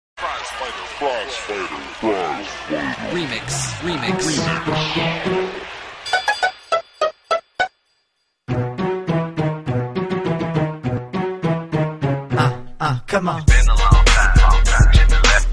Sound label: cute